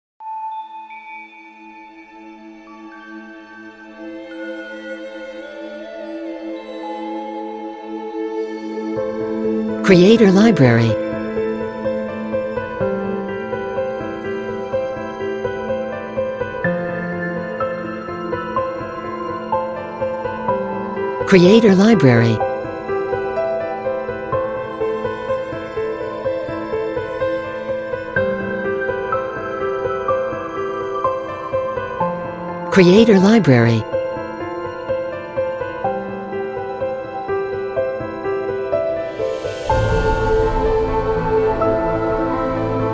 Emotional Film Score

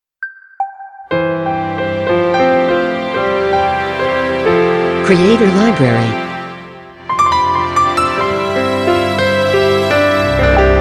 Modern Orchestral Ident; Logo-Idents

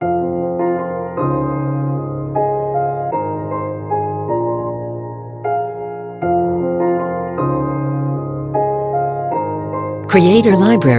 Beautiful Piano; Pop

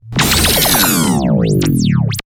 Impact Starter

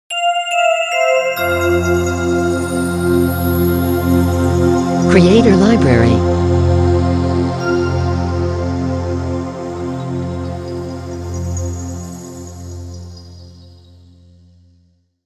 Soft Opening Logo; Logo-Idents

